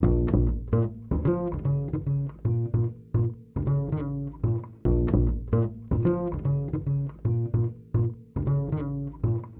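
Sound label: Trad Jazz Double bass (3-4), Bbmaj, 150 bpm; Pro Tools Essential Loops; Digidesign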